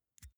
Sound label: pull out xlr extension slow; objekte; elektronik